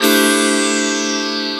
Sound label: Quadra Pad (Fusion)